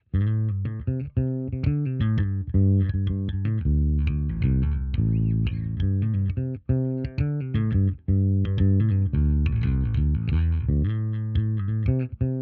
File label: bassline